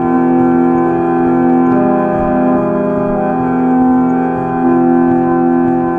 looping mellophone

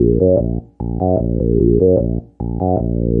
hidden synth bass; BASS LOOPS

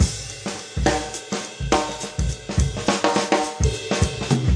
105 bpm swingy jazzy, drum loops and breaks

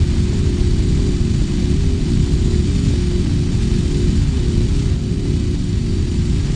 tremendously thick layer, looping ambience

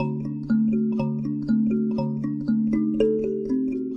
ambient kalimba; WORLD LOOPS

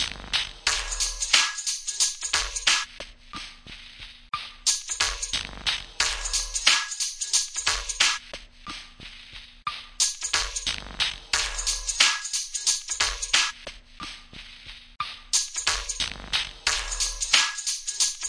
090 bpm old radio dirty breakbeat

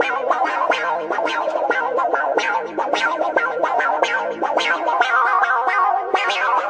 wakka weirdo guitar; GUITAR LOOPS

